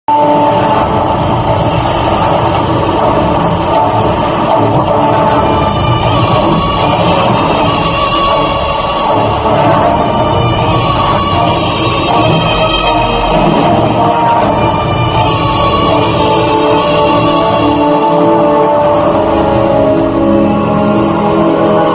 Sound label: villain entry bgm; prakashraj; waltair veerayya